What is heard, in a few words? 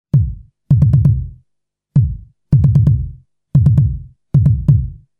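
Bass Drum trapped noise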